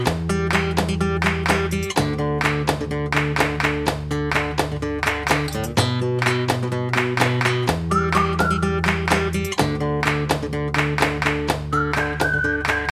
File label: Whistle Drums cover